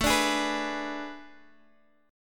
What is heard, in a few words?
A Major 7th Suspended 2nd Suspended 4th; chord